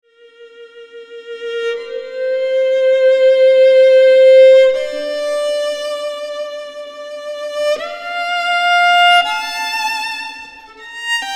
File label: Violin; Folkestone